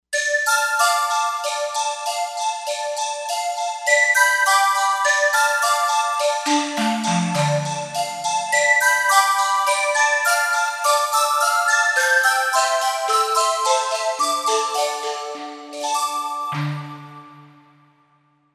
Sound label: Korg M1Rex, Whisps; Factory Patches